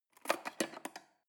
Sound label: cutlery cake fork drawer scrape